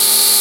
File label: Suspension Move; car simulator 2